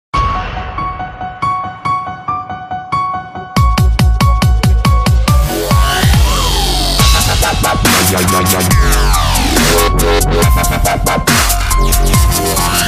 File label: halloween dubstep